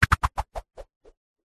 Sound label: cartoon boomerang throw spin whooshes